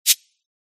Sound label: card draw